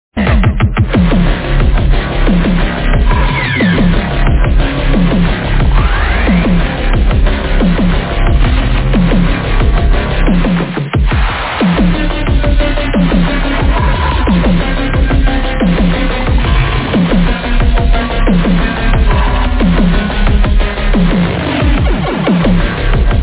drop

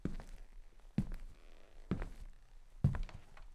walking fx

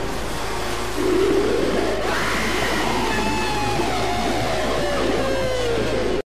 megalodontia scream

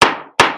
gavels

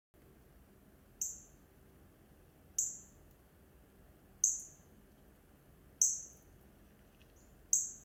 cardinal call

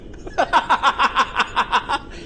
team laugh

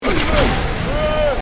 witch doctor soul harvest skill

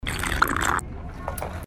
drinking; ryan trahan

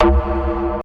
donk sound effect

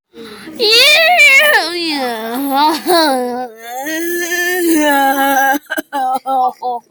big fart poop

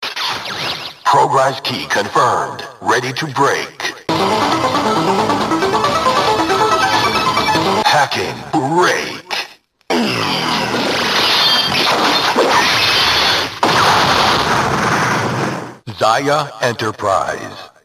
hacking break

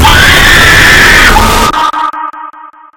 jumpscare sound; project midway; bushy

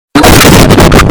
very loud vine boom

